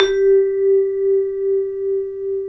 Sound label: VIBRAPHON; ILIO